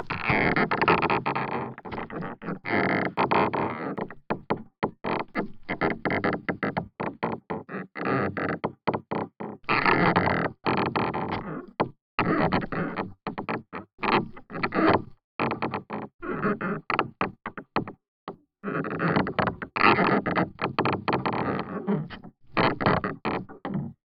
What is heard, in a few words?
Sailing